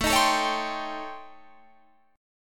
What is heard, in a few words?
A 7th Sharp 9th Flat 5th A, Guitar Drop D; chord